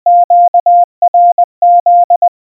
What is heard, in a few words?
QRZ, --.- .-. --.., Морзе; Кто меня вызывает?; Генератор азбуки Морзе